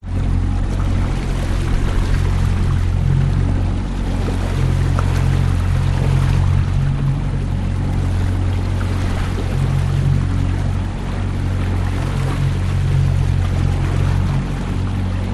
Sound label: transport boat drive sea slight choppy slowly outboard motor 16 ft fiberglass; at side